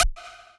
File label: lead